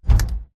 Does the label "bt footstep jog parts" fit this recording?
Yes